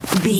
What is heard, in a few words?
footstep snow run